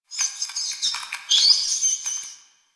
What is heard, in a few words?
Town Street Critter, Ambience; Warframe; Duviri